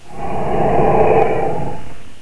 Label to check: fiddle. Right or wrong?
Wrong